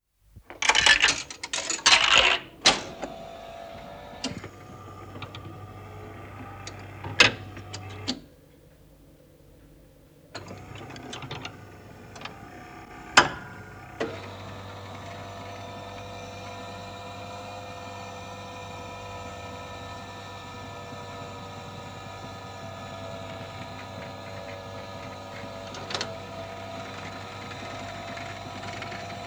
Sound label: turnstile